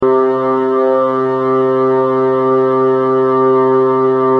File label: winds Loop C3 Oscilloscope